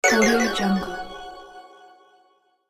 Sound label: flash fail